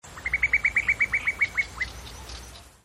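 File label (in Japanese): kakko, cuckoo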